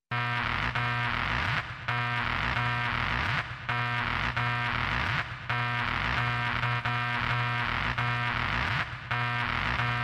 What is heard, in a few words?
Techno; Underground Rave